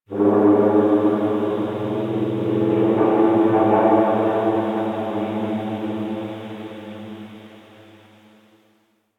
Factory Texture, Ambience; Warframe; The New War; Zariman

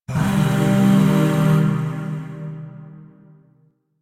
Shawzin Blues Chord A; Chords; Warframe; Zariman; Void

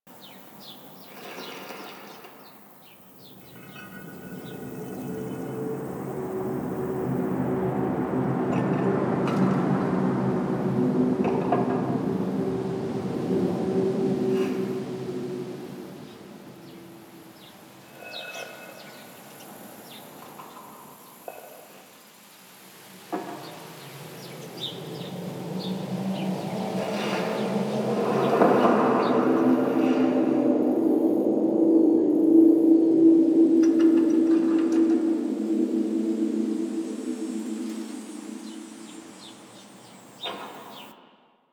Summer Exterior Medium Bed Loop; Warframe; Nineteen Ninety Nine; Ambience

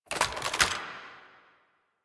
AK47 Reload Raise; Warframe; Tenno; Weapons

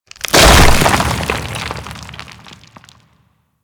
Golem Scythe Pull; Warframe; Attacks

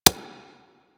Infested Minion Step Default; Warframe

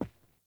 Boot Walk Wood, Footsteps; Characters; Enemy; Melee Grunt; Boots; Sinecure

